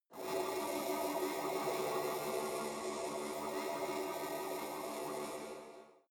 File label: space inside biomedical alien shuttle buzzing energy